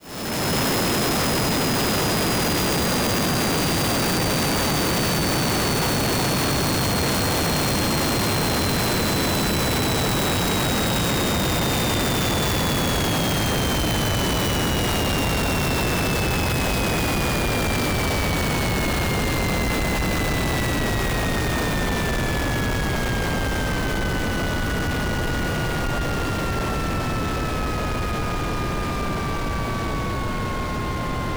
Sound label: jet engine